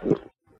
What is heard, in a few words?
gulp one time